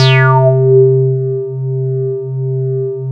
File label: syn, Techno; Techno ID